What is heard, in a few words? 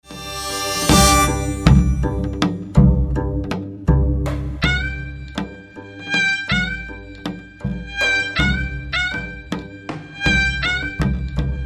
gugak orchestra